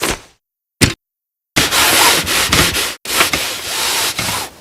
ironing; Ellen

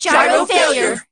shadow spin; p4u; aigis

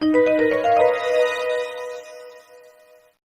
find

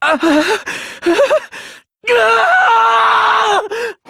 scream; dr2-hajime

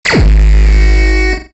investigation start

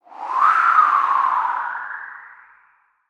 wind

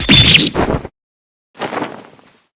breakdown

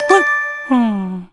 surprise; Patrick

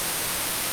hologram sound

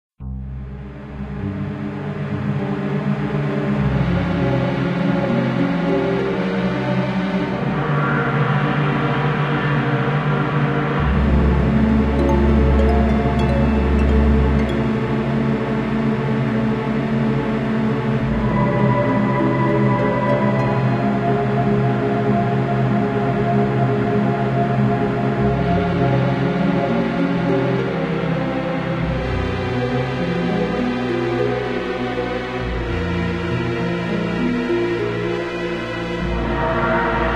Hesitation, Tension

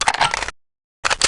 minigun prepare; p4u; aigis

